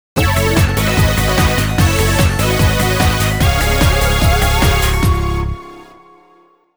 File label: Mission accomplishment (Level clear)